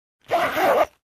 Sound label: Luggage Zipper close